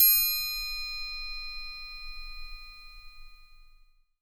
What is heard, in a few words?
conk, Triangle; Misc Percussion